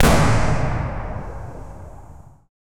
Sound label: a single impactful shot, historical